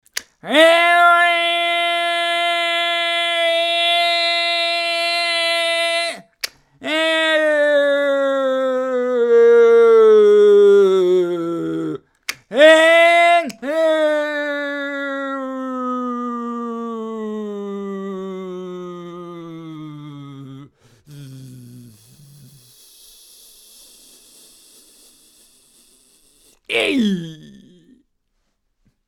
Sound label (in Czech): sekacka